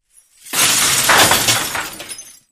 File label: Glass Falls Heavy; windows & mirrors